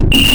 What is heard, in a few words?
ALARM FX; Big Beat Samples & More; AKAI; ALARM KIT